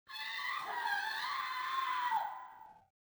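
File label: scream, female; Soldier Of Fortune II Double Helix; XBOX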